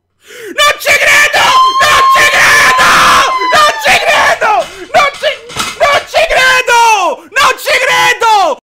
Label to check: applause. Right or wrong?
Right